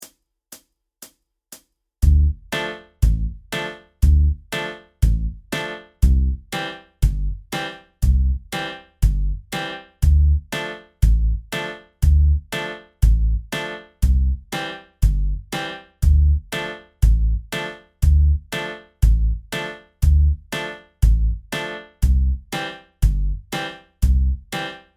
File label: Drunken Sailor Tempo 120